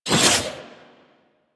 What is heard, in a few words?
Warfan Whoosh; Warframe; Tenno; Broken Frame